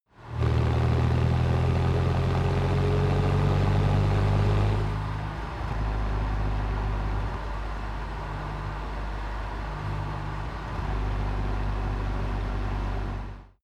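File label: sports car interior engine accelerate decelerate; verkehr-transport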